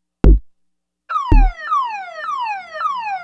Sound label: Perc FX; Sonic Foundry; Sony Creative Software; Stylus Pressure Urban Grooves on Digital Wax; Nu Tang